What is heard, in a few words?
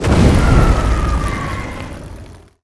airplane burn; Hearts of Iron IV Death or Dishonor